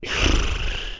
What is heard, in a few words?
pig snore